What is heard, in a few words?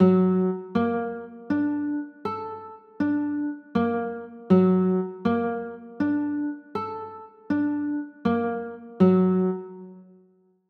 g m maj9, chords